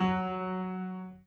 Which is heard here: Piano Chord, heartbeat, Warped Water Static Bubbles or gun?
Piano Chord